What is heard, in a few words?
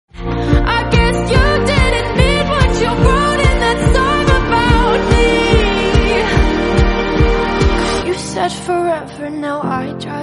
English song